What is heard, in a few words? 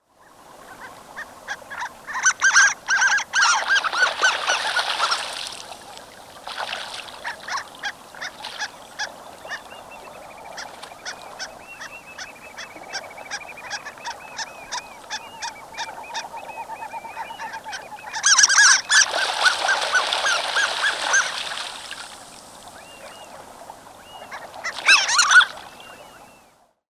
Harlequin Duck Sounds; All About Birds Cornell Lab of Ornithology